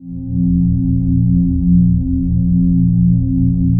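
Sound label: PAD, atmospheric pads; Best Service; XX Large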